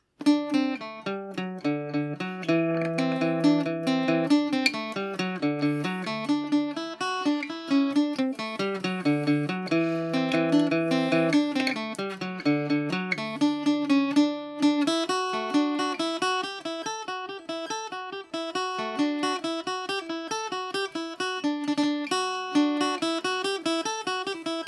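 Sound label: D mixolydian, Irish mandolin; sporting molly revisited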